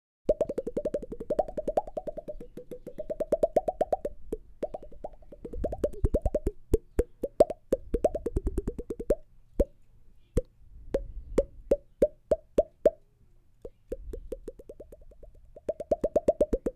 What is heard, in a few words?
Popping Noises